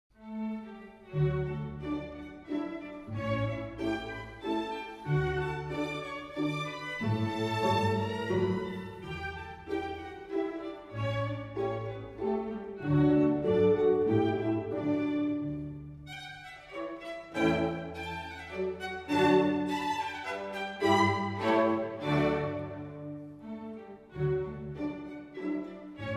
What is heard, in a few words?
Divertimento No. 17 in D Major, K. 334 I. Allegro; Mozart, Wolfgang Amadeus